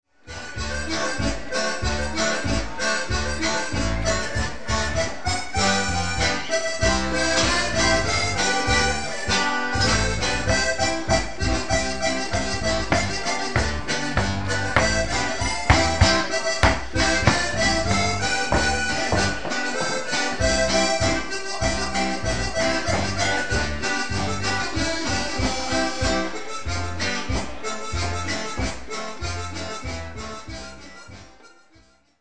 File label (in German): timple boarischer